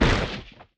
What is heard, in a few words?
asteroid collision carbonic small; Factorio